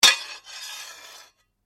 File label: Blade Lock Scrape, Sword; Foley sounds for melee weapons; Swords; Combat